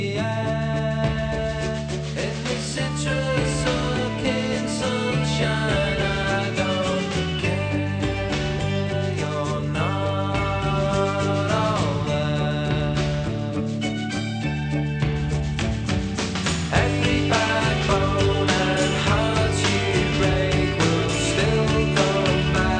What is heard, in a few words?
rock